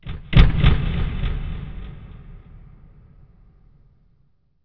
PERCUSSION

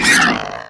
ATTACK; mahlendur

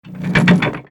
wheel turn

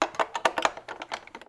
picklock failure

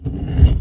touchplate stone small

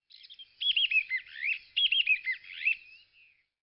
BIRD

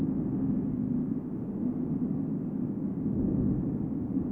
wind cave

